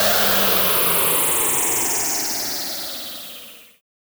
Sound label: Downshifter